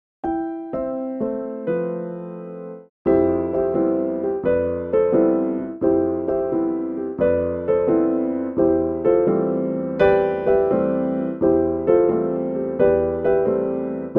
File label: Jazz